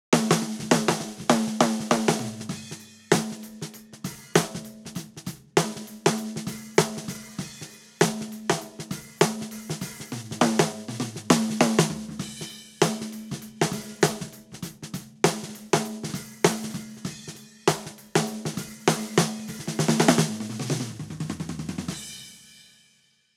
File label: Drum SN; 1073OPX